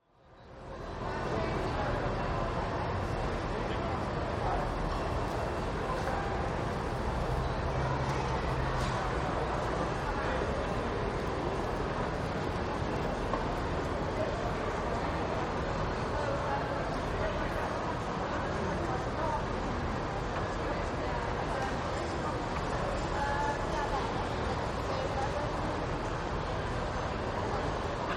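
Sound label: ambience, London Marylebone Midday; noise creations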